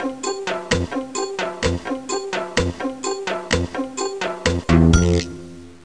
Waiting Answer Slow; Let's Discover Michigan!

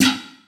DRUM SHOT HOUSE 125; eJay; Singleshots

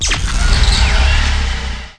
Start Windows; 250 Desktop Themes; Quake

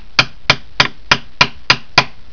HAMMER; winpolis

